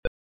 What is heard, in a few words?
Start, Beeper; Hunchback